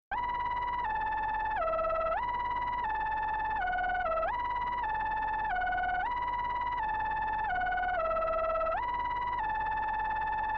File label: MOOG